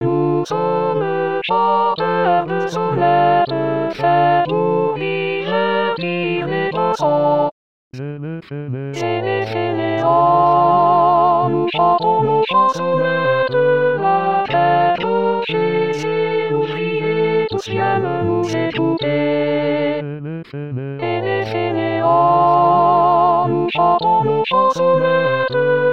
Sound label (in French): sornettes alto; 2023 st jean